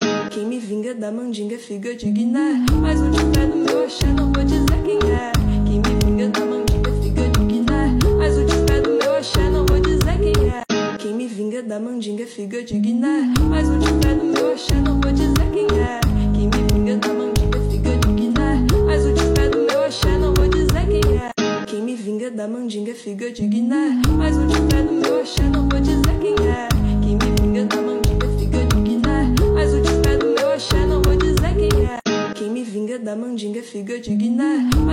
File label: remix